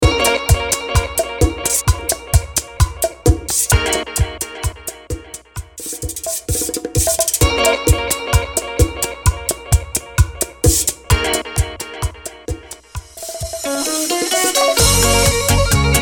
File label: Intro Outro Break 130BPM